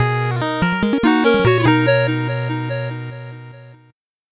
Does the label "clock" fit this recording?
No